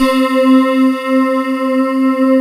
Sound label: O K CHORAL, ROLAND D-50; Rubber Chicken Software Sample Bank